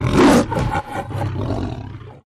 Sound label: Tiger Roar Ext, big cats tigers